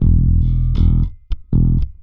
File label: Rock, Bass; Bass X